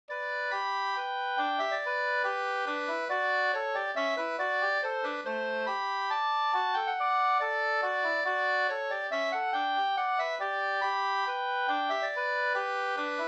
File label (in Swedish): hambopolska; Gunnars hambo e Gunnar Hellman 2st